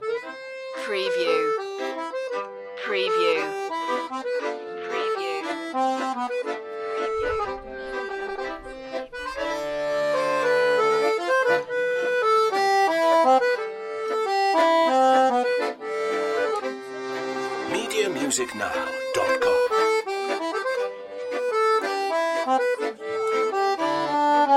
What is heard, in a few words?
french street musicians song